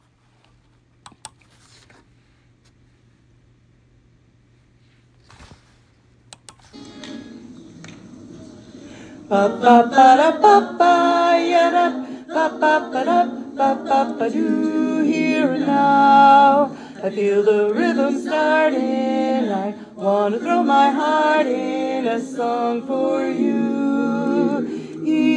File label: baritone